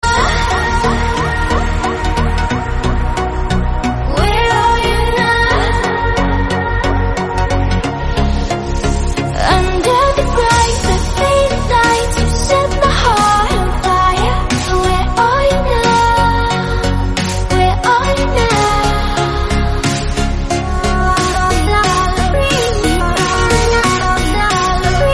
ringtone

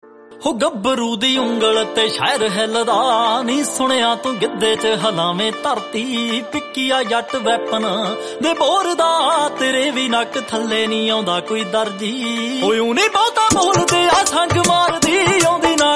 punjabi

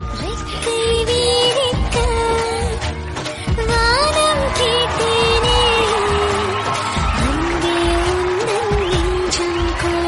south love

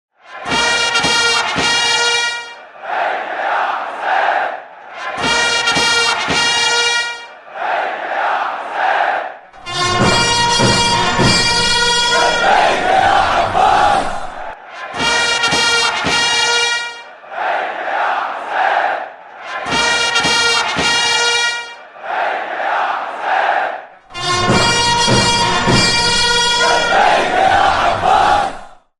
islamic instrumental

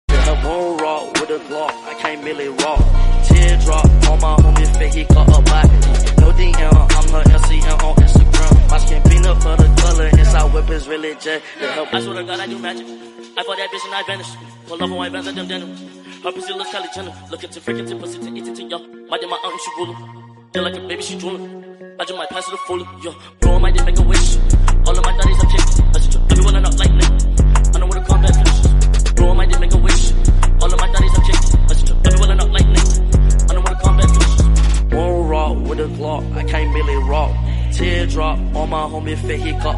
english song

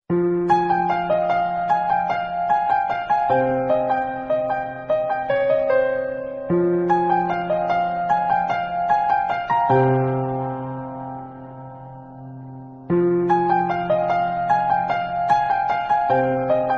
BGM Love Ringtone, Tamil Song

